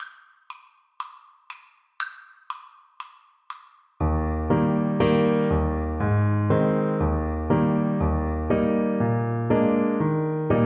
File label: old macdonald, Saxophone